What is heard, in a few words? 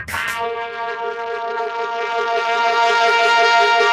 sad trombone